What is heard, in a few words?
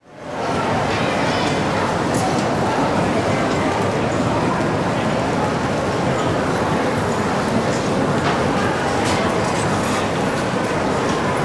Airport Active Crowd, Walla; Sound Ideas; Walla Series